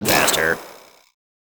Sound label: faster weird; HeavenStudioPlus; samurai Slice Ntr